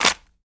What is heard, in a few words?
Bone Crab Shell Break